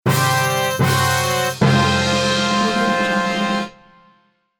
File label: classic defeat fanfare